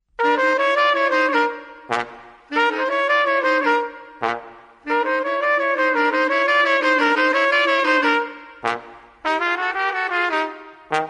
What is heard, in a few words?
apex blues